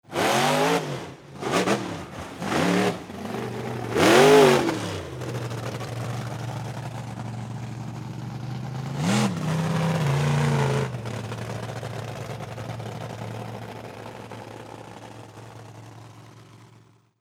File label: monster truck race